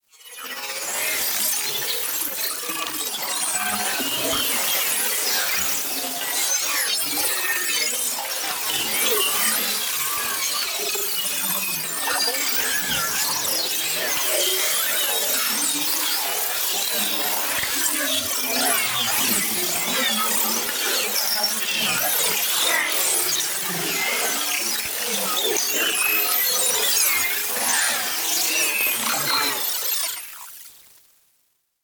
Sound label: PARTICLES SMALL FAST Ion Flow; NEON; boom library